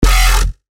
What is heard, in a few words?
bass shot F; Draft; revealed bass shots vol 4